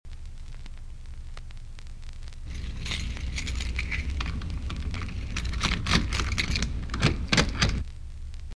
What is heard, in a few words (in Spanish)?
puert